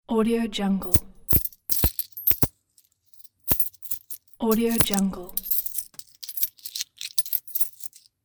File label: coins